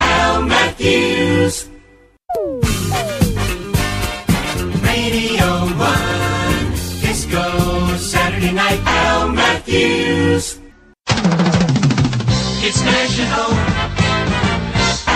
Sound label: jingle montage; al matthews